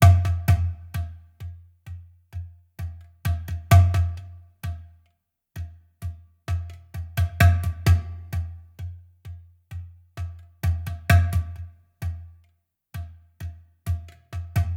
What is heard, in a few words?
Dhol - 130BPM; Percussion Kits; STEMS; Ghosthack; SkyRealm; Kit 15 - Foreboding Darkness